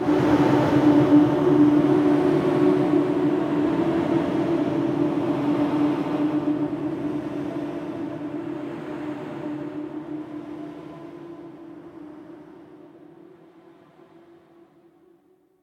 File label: Cue 110bpm End